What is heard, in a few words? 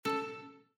Windows Default